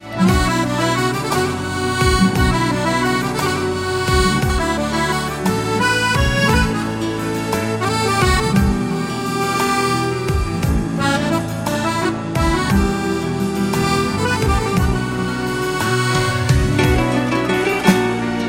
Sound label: Ringtone